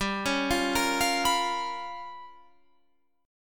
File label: G 7th Sharp 9th Flat 5th G